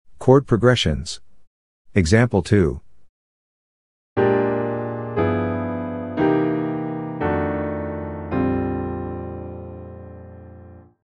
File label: chord progressions